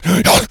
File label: rage, infected, male